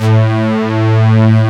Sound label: OB STRINGS, OB Synths, Analog; E-MU Formula 4000 Series Vol. 3; Analog Odyssey